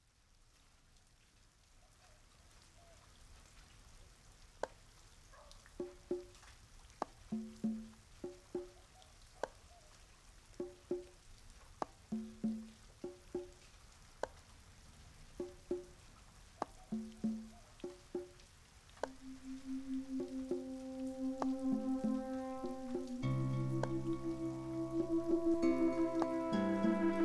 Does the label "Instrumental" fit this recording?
No